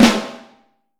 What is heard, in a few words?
Vintage Snare Drum; Q Up Arts; Johnny.C's Vintage Snare Drum; 4X14 JCSM